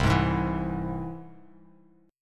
C Augmented down Bass Standard; strummed; JGuitar

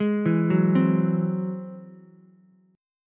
G sharp Augmented 7th G sharp Ukulele; G#+7 arpeggiated; JGuitar

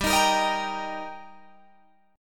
G Minor Sharp 5th G Guitar Open G, chord

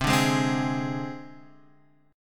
C Suspended 2nd Sharp 5th, chord; Csus2#5 strummed; JGuitar

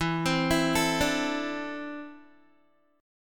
E 7th Suspended 4th Sharp 5th, Guitar; E7sus4#5; arpeggiated

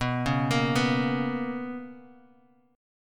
B Major 7th Suspended 2nd Bass Standard, chord; BM7sus2; arpeggiated; JGuitar

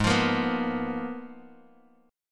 Ab Minor 6th Add 9th, down, Guitar, Open F; strummed

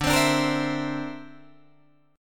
D 7th Suspended 2nd Sharp 5th, Guitar Drop D

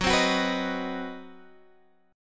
C Minor Major 7th Flat 5th Gb

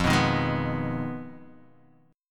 F Minor 7th Flat 5th; Fm7b5 Chord {1 2 1 1 0 X} strummed

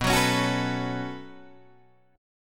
B 7th Suspended 2nd, Guitar, Open D

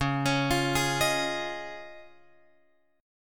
C sharp Major Add 9th

